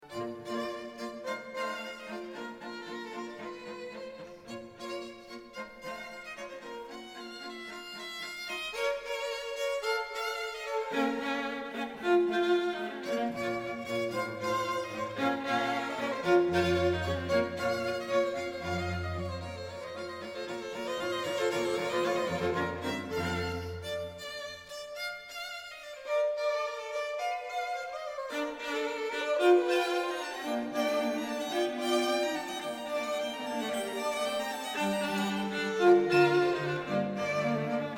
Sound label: String Quartet in Bb